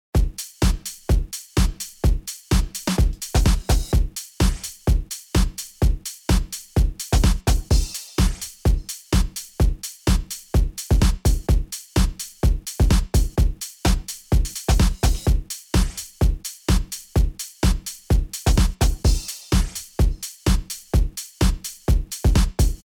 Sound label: Sampled Drums Before